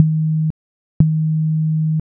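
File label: Vibration dot dash; Motorola E398 Asia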